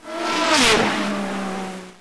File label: flash